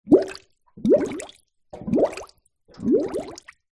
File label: nature water bubbles blown into basin very light room reverb